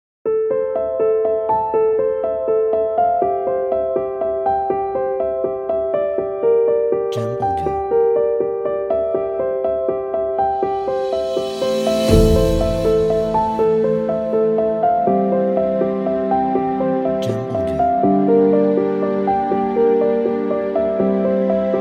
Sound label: Epic Piano Cinematic